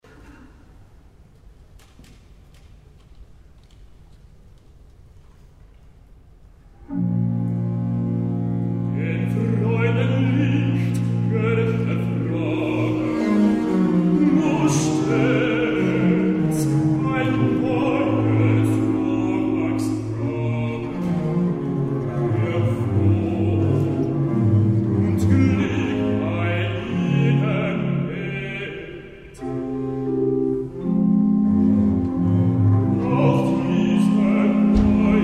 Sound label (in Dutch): Recitativo; Dem Freudenlicht; J.S. Bach